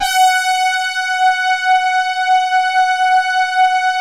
OBERH, PADS; Vintage Voltage

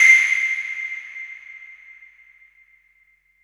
noise fx; Masterbits; Switch FX

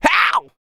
YELL, MALE HUMAN; ILIO; Vocal Planet

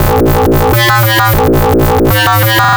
Popwow Bass, Drum n Bass; KJ Sawka; Drum n Bass & Beyond

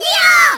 Yaaaah!; SNES; Zig Zag Cat

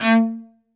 SOLO CE, Strings; ILIO

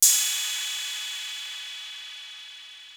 CYMBAL, TR-808; Hollywood Edge; The Big Bad Beatmachine

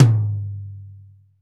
TOM, Toms, Roland; Northstar - Drumscapes Roland

